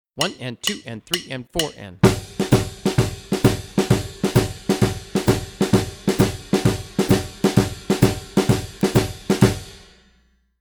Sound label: double shuffle; daniel glass